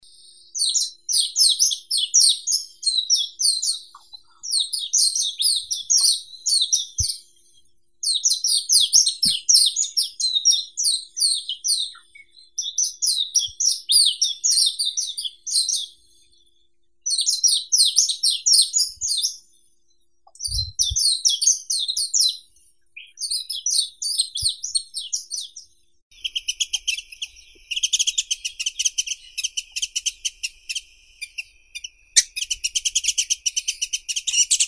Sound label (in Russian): beloglazka yaponskaya zosterops japonicus